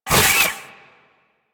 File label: Kick Whoosh; Warframe; Venus; Venus Terra Moa; Abilities